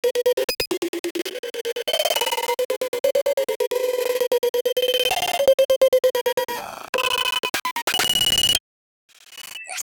dark trap glitch sweep; gritty

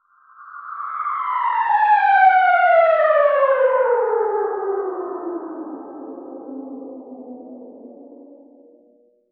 territory expand

